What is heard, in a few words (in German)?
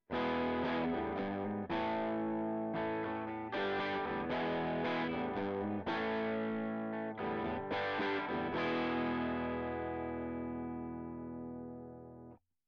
ToneX Pedal durch Scarlett 8i6